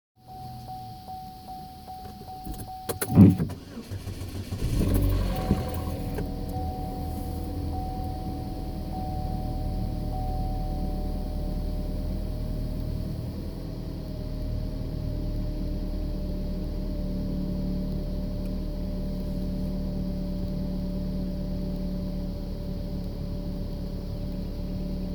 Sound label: suspected fuel leak delayed start